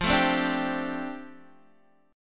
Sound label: F 6th Add 9th, Guitar Open D